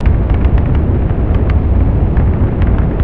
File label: 3D Rocket Loop, ambience; nexus tower